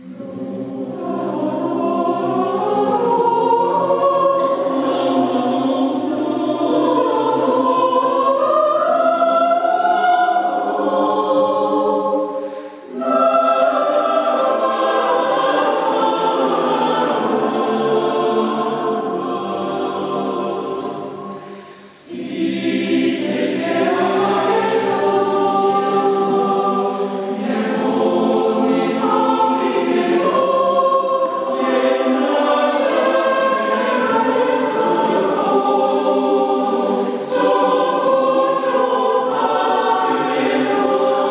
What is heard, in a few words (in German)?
Chor; Kirchenkonzert des 3 Klang Chor Rohrbach